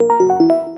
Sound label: failed